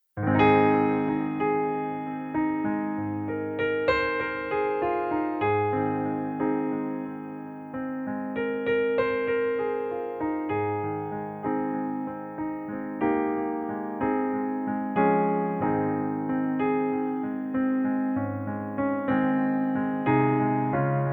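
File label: wedding version piano playback Ab major; Hochzeitsversion; Ab-Dur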